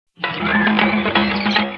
SLON; GryTygryska

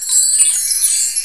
zvonek

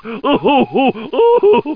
klaun

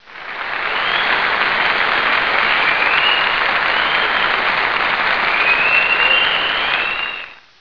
potlesk; DrMozek

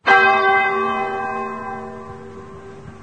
zvon; Moribund World

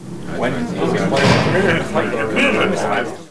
paneláK; pavouk

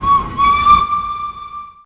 HOUK; Krtek

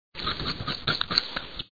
pole zrani

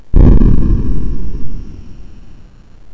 vybuch; TANCIK

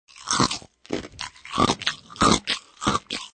mlask

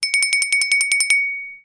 ZVONEK; Krtek

